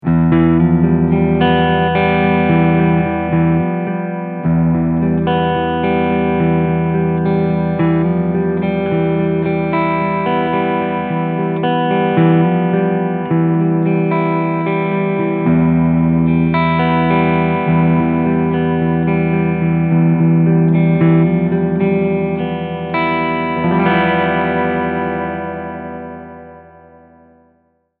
Schecter C 8 Multiscale Cleansound Front humbucker

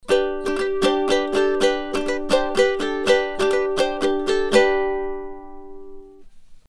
Sontronics STC-20 Niere Ukulele

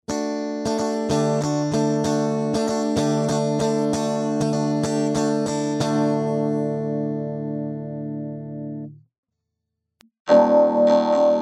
Rode RODECaster Pro II E Gitarre plus Pads

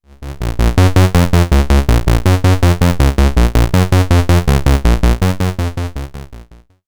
MOOG Mavis Sequence PWM durch internal LFO gesteuert

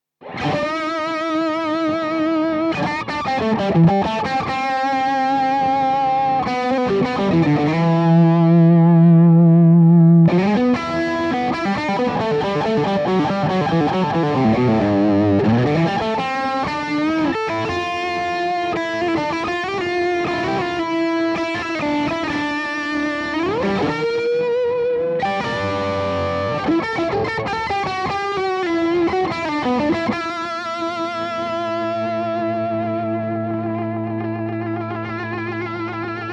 Tele High Gain Blubox Vergleich

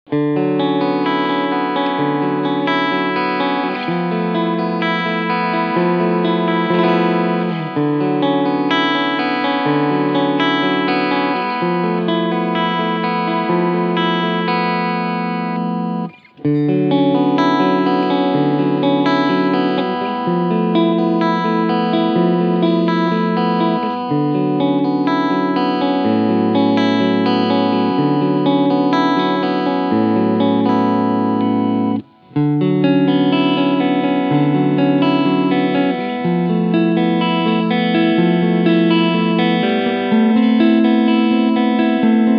Ibanez PWM20 Cleansounds Singlecoil Modus

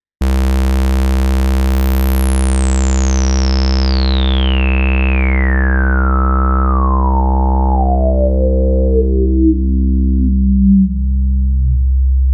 IRIDIUM Saegezahn auf 1 Dual Filter 24 dB Dirty Lowpass Resonance 70 Stereo Out

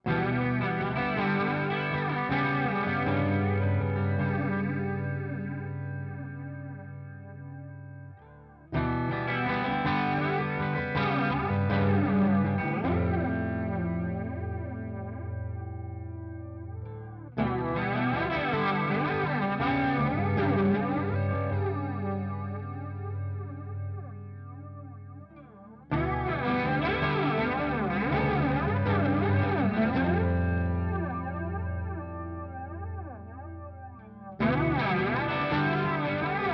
Walrus Audio Polychrome Feedback Regler

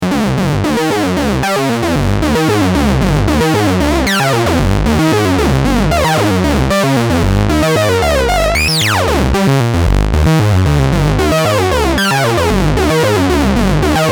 Brutal Sync verschiedene Wellenformen

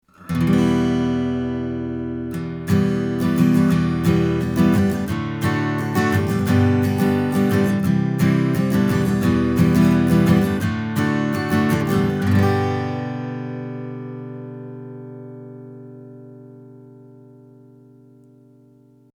DPA 6060 AGit Strumming

